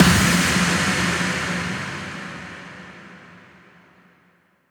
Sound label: Impacts, Effects